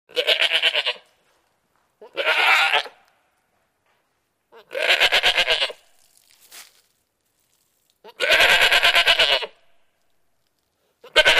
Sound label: Goat Multiple Bleats; goats